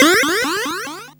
Arcade Riffs

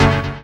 Synths F#; vengeance essential club sounds-3

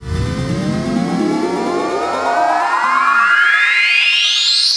Sweeps Medium

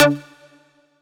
Synth Cuts; Vengeance Samples; VES1 Instruments

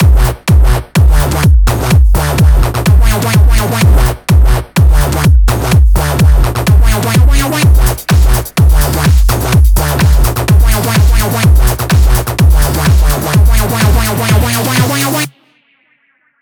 126BPM Mix; VFH1 126BPM Comeback Kit

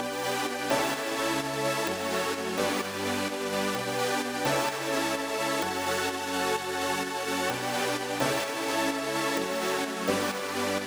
128BPM Pad Root G, Tech House; Full Effect; Vengeance Samples; Bonus TechHouse Kits

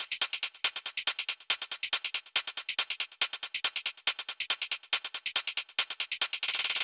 Loop 140 BPM; vengeance essential club sounds-3

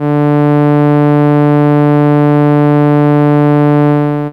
Cello D3, ARP Solina, unmodulated; musicradar-synth-samples

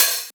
Cymbals HH Open; Vengeance Samples

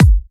Bassdrum; Vengeance Samples; VEE Bassdrums

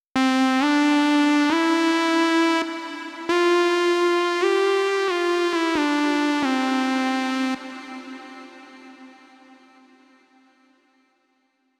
134BPM Mainlead Outro WET, Cmajor; Vengeance Samples; Lovely Day Kit

